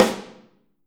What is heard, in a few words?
Snare OH; Snare OH All; Drums Percussion